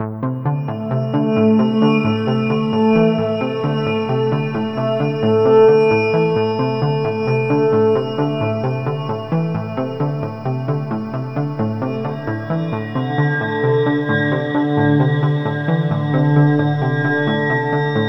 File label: Loading Screen Ambience; Watch Dogs